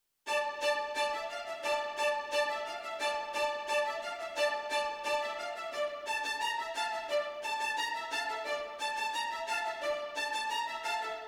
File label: strings